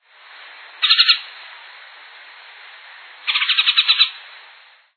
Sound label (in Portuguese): benedito-de-testa-vermelha